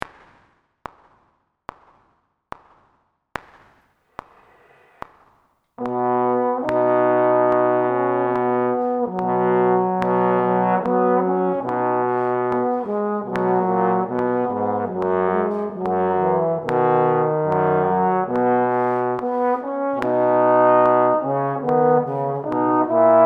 Maj 11 B, trombone; B major; The Tone Ladder

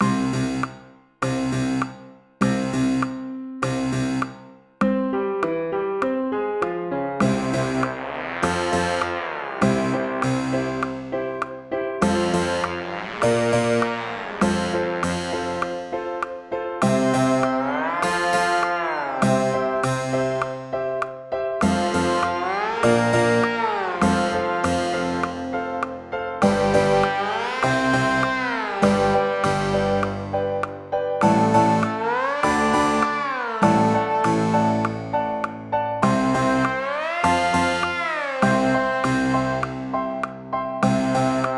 range tenor, trombone; Range Stretch; Tempo = 100; First Habits Warm-Up

